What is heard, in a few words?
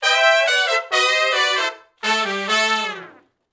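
R&B Riff (A) harmony, Horncraft; Sections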